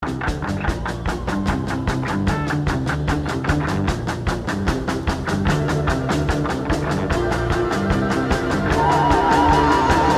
New Ringtone; Samsung Galaxy M14 4G

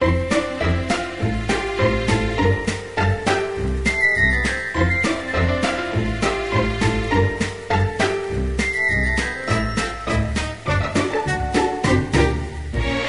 Sunrise View Ringtone

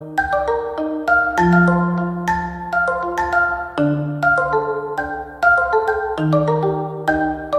New Samsung Galaxy A25 Ringtone

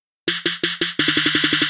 Simple Dirty Snare Roll Ringtone

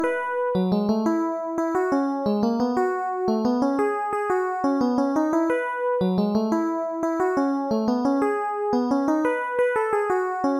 Piano Ringtone; Samsung Galaxy M34 5G

